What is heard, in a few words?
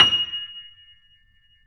F 6, Keyboards Acoustic, GRANDP ST; USB Soundscan vol.17